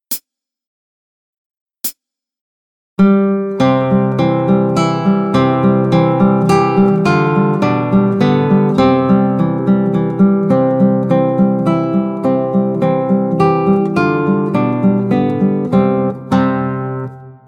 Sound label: Allemande, Gitarre; Bortolazzi; Play Guitar Gitarrenschule 2